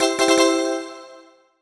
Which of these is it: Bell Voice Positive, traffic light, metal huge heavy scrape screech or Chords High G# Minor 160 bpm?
Bell Voice Positive